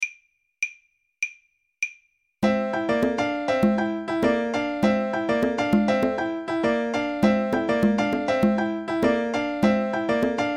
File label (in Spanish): bongos; reciclar tocar